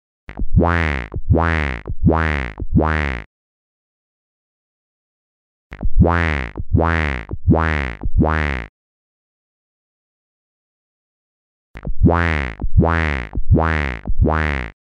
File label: Froggy; PM Flummi